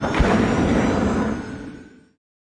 rocket car

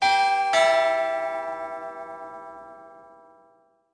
presidential estate door bell; 4th july

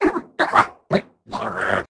pet mutant plant tap; ea tsto

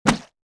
monster fight; herman

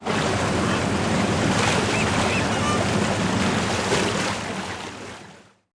houseboat tap; ea tsto; terwilligers; springfield

